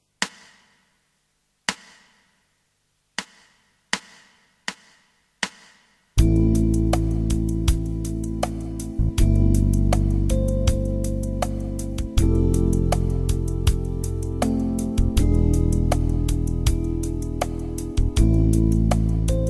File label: Mixed Note Values, guitar backing